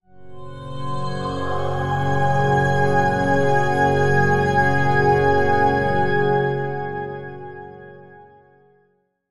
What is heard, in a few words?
Link Sting